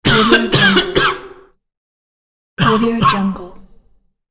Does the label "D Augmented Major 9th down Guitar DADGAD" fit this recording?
No